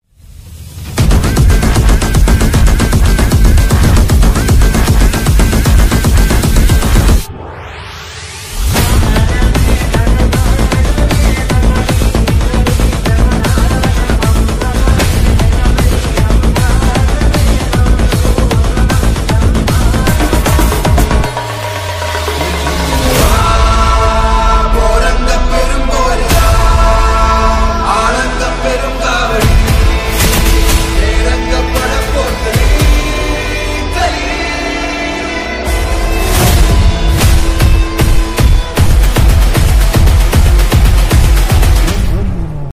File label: bgm ringtone attitude ringtone malayalam bgm; turbo movie; mammootty